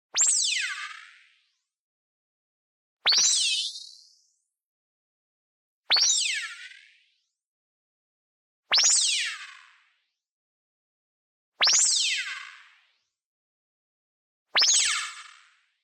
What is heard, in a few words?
Spectral Shifter; ZAP; boom library; Anime Essentials; DSGNMisc